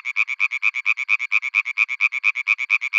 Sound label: European Tree Frog